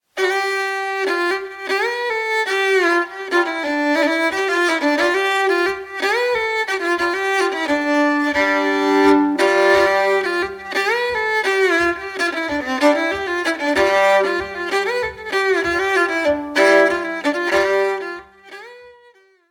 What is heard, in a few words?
gliss; seilimaakari